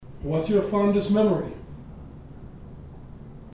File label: bells; sturges paranormal